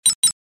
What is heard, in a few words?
Double notification beeps